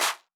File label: clap; Electro; chillhouse